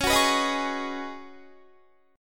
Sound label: Db 7th Suspended 2nd Suspended 4th, Guitar; Db7sus2sus4 strummed